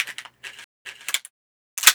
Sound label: pistol reload; Foley; Doom